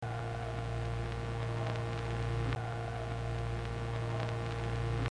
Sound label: hummmm